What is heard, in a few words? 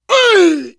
hurt critical